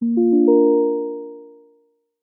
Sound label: game Begin